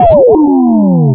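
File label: Space Rifle; freetrading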